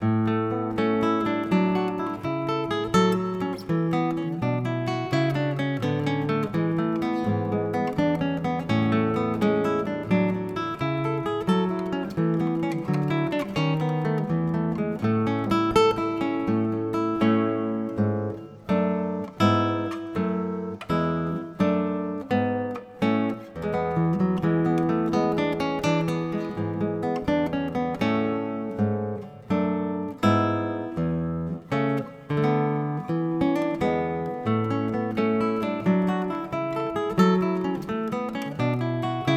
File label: Gtr